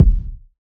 urban kick single hit D# key, Royality free kick drum single hit; kick drum samples